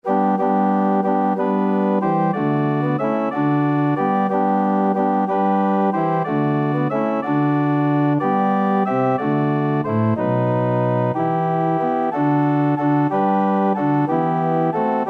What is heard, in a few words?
ORG, organ